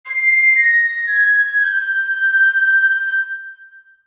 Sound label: SFX Wood Wind Melo